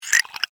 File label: Alien Insect Vocal, Scifi, Alien, Insect, Vocalization, Creature, Small, Science, Fiction; SoundEffects-BlastWave